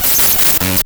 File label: Distorted Reality; Spectrasonic; DIGITERROR